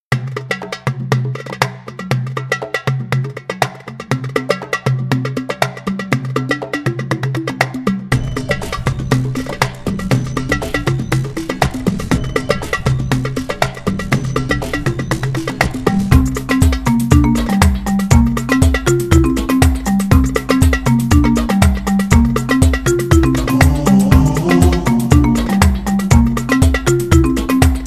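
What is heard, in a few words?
Africa Pop, Production Music; Etink Collection; IDYLLIUM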